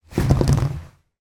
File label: household toilet roll on holder pull spin